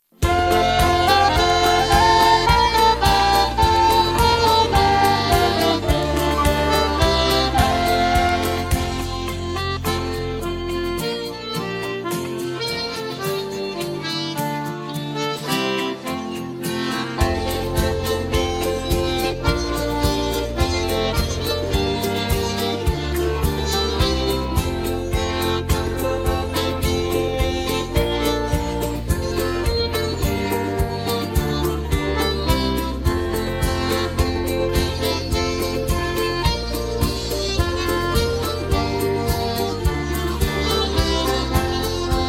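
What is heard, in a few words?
backing track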